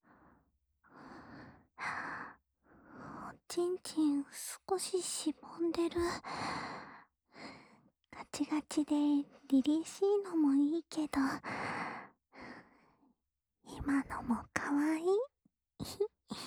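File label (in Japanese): 気持ちいい耳舐め（右耳）, ASMR; 気持ちいい耳舐め実践（右耳）; なつみによる気持ちいい耳舐め実践（右耳）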